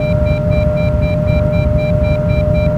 health low loop intense

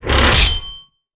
tread fixed; tank repair; World of Tanks Blitz

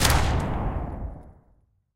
shvak shot, guns

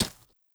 horse footstep dirt hard; Rust

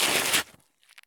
stop asphalt; Escape from Tarkov

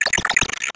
drone chirp

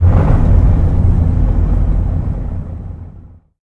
t90a engine int load, engines tanks; War Thunder

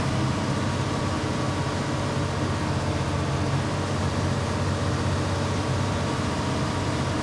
engines; World of Tanks

